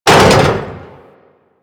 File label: Step Metal Debris; Enemies; Steps; Warframe; Corpus; Arachnoid Camper